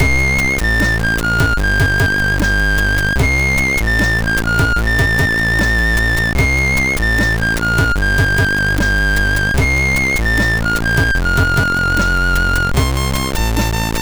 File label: sid MOS6581R3